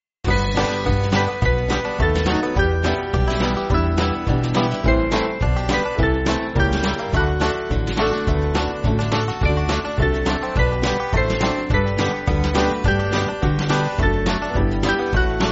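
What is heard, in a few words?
Band, Backing Files for Churches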